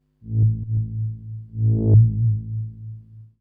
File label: SNTH BASS TEKNO 140 A